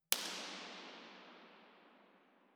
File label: IR sweep; IMP-RESP